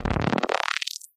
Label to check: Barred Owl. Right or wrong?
Wrong